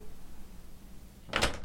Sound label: locked, house